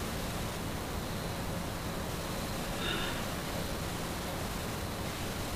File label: female gasp